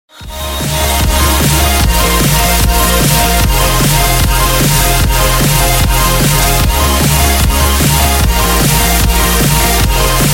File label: Galaxy S8 Ringtone; Samung